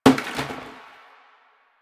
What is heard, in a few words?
Janitor Plastic Bottle Water Drop; Warframe; Ambience; Nineteen Ninety Nine